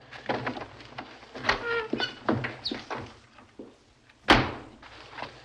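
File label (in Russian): закрытие двери с деревянным экраном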